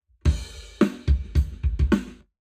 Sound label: Drums, 0deg; Lab Wet